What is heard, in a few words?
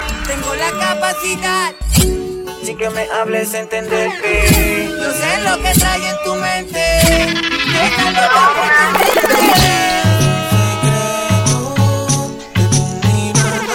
Reggaeton